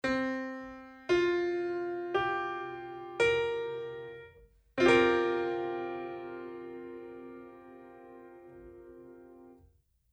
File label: C7sus4